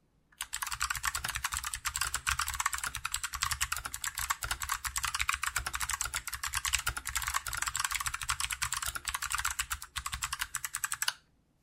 SGK 50 S4 Kailh BOX White Normales Tippen

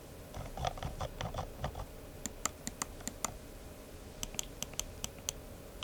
Scrollrad

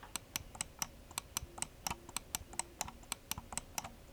seitentasten

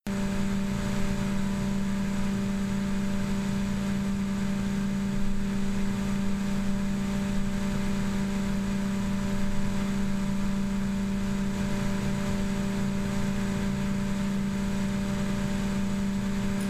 Laut

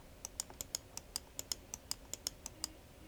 Sound Maustasten

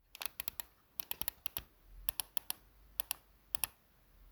Daumentasten links

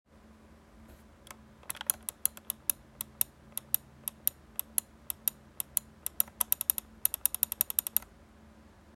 Rechte Maustaste

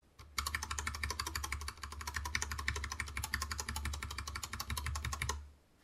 ESC haemmern

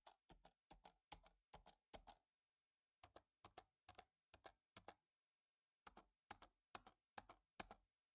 Leertaste Backspace Enter

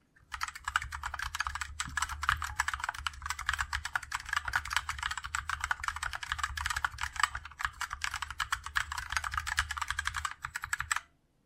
SGK50 S4 Kailh red Normales Tippen

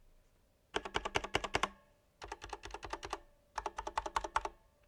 Sound Leer Zurueck Enter

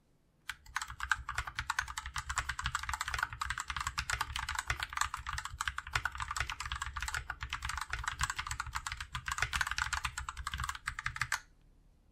SGK50 S4 Gateron Milky Yellow Normales Tippen

